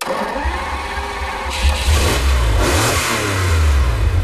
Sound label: startup, vehicles; countach